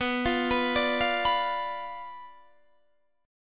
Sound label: B Diminished B, guitar